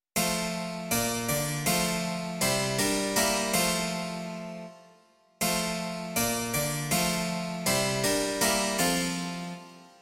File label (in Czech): cembalo; Již Babylon hříchu klesá; Imaginativní verze